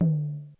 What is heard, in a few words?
HiTom, Korg DSS-1